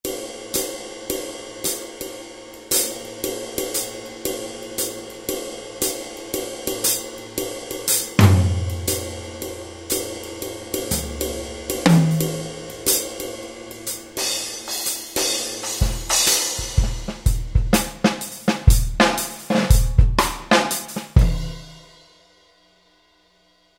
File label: Wide Jazz KIT; Roland SRX-03 Studio SRX